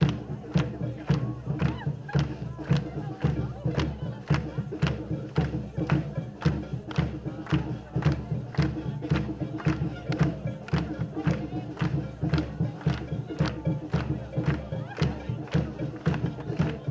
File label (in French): manif